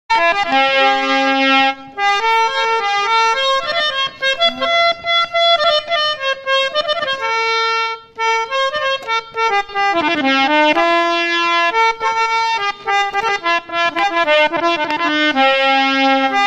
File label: piano Ringtone